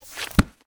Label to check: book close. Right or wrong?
Right